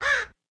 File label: AMB China 3D Amb